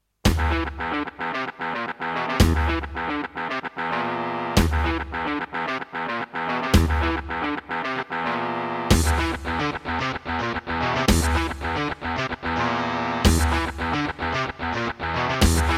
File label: Roland CM-300; Doom - E1M1